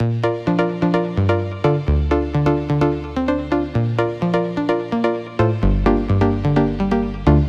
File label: House UKG Piano Dm 128bpm; house ukg keys